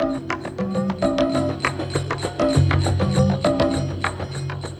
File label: Ambi Groove